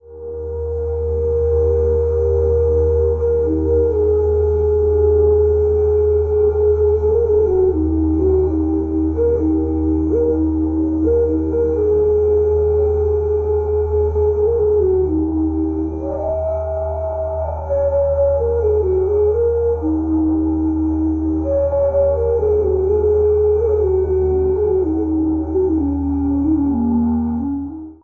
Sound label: flute; Ocean